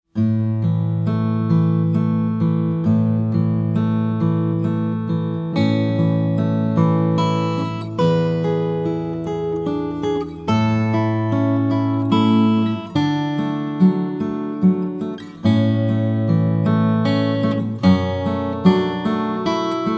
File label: Soothing Acoustic Guitar